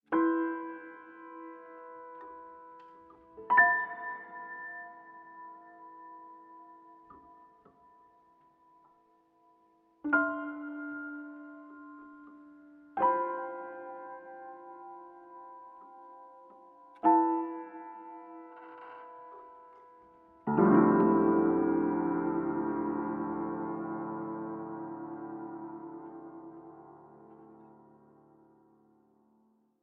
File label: tune